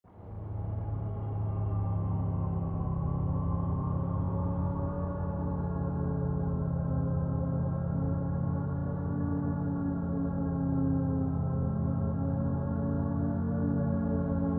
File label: Feeling Uneasy Creepy Ambience; dark ambient music